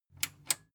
metal button tiny click disable slow

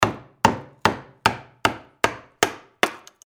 hammering

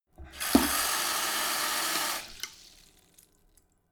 sink fill splatter hard short

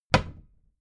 cabinet door close heavy

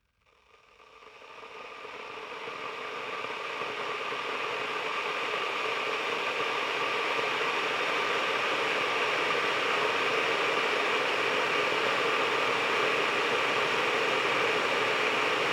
water boiler boil

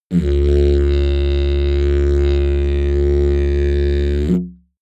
didgeridoo heavy soft

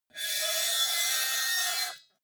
metal lid screech short

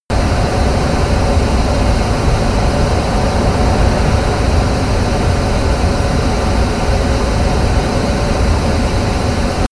plane airbus a320 turbine steady distant

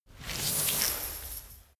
chain mail pick up

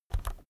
keyboard external key press fast soft

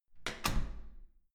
door close

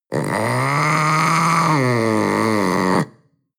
panther young growl

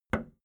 video tape player plastic play push

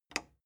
plastic button small simple push

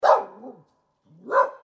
dog barking

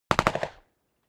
smg uzi 45 distant

